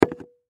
Jar Set Down, Foley Jar Set Down Wood Surface Glass; Food; Household; Blastwave